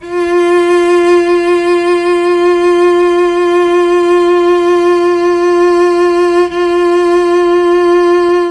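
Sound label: F3 chamberlin cello; CHMBLN CELLO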